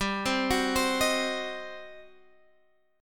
G Major 7th Suspended 4th Sharp 5th, Guitar, Drop D; x,10,10,11,13,11; down